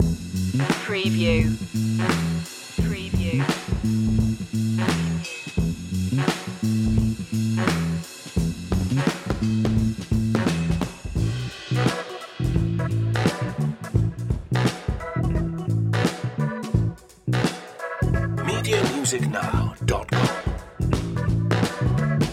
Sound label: cashmere deluxe loop